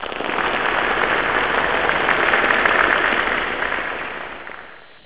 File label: clap